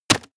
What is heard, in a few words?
medieval click light negative